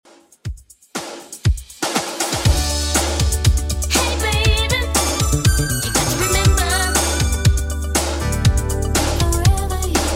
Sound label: 80s Redrum 120bpm